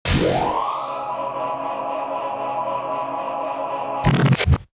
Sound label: Warp Engineering, Sci-Fi